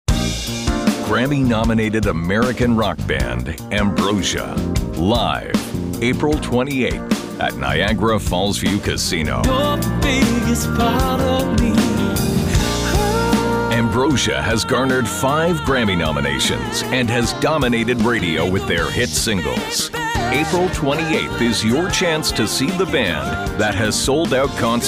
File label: SOFT ROCK; voice artist